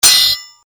sword hit

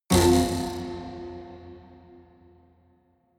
haunted strings buzz

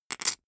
poker chip stack small rattle short

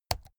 keyboard laptop key press slow hard

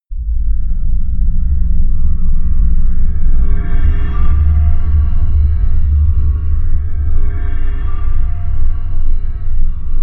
drone panic

